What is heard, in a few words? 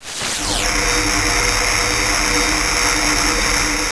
Beam, Weapons